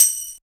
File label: TAMB; Zero-G; Funky Elements